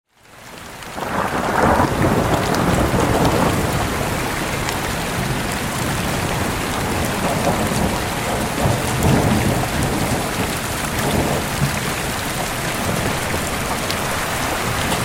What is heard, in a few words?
Dark Screen Howling Wind Thunderstorm Sound Rain Sounds for Sleep